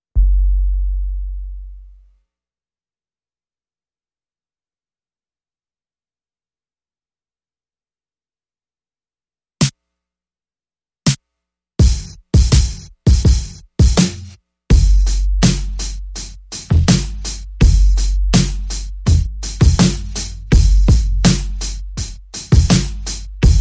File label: Drums Only